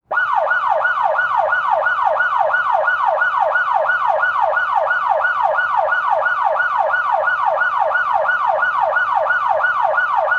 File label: Emergency Siren Police Yelp In City From Behind; emergency effects; AdobeCC